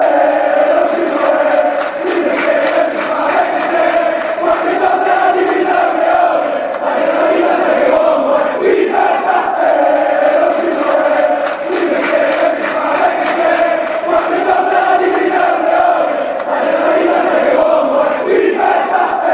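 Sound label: fanchants, football chants; eh ale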